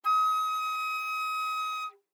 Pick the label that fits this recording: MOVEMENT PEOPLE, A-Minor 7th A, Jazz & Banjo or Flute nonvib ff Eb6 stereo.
Flute nonvib ff Eb6 stereo